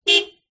transport car horn single beep external toyota corolla